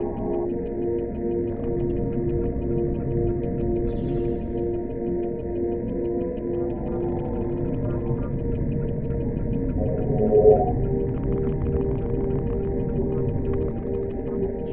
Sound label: sounds music; Halo Combat Evolved; Xbox